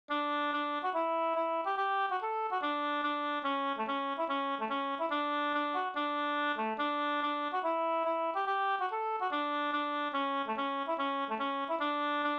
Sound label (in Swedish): reinlender